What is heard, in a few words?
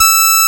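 TR MUTE, WIND HORN; AKAI S6000 CD-ROM - Volume 1; BrassWind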